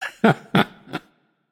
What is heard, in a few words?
hehheh; smite; shiva